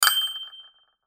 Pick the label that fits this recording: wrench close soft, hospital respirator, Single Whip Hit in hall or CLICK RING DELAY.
CLICK RING DELAY